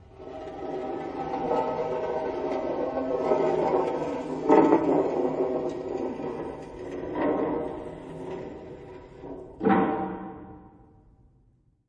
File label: METAL DRAG